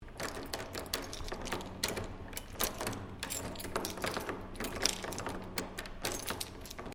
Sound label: Door Heavy Fire Escape Lock Operating, heavy weight door to fire escape; Miscellaneous Doors